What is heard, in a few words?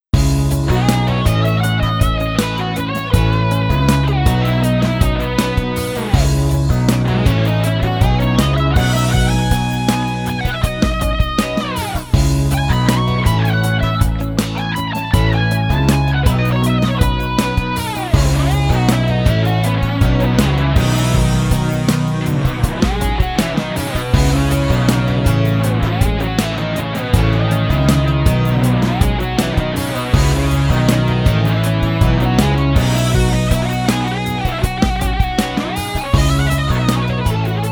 overtone dist